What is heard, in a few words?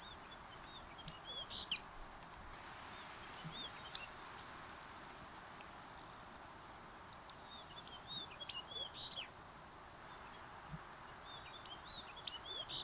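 korolek, goldcrest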